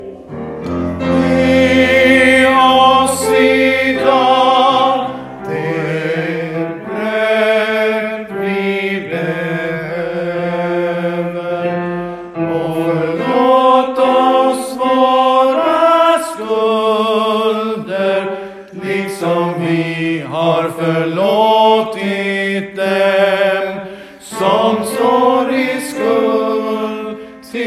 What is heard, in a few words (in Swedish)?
Vår Fader Tenor